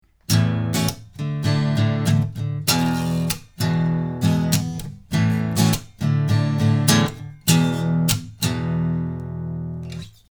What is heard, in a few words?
Git classic